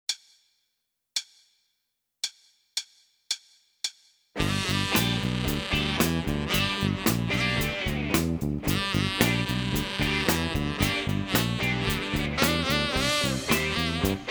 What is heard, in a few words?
Rock Roll